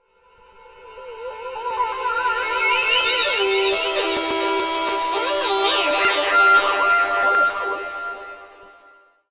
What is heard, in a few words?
guitar flower pads; GUITARS